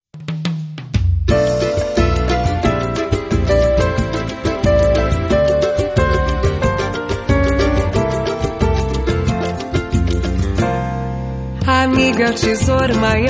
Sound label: samba